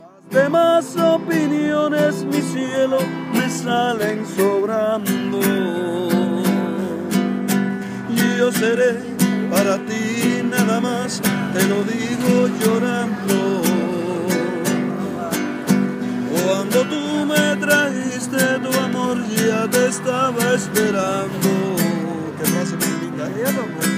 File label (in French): Malecon Cuba Chanson Mexicaine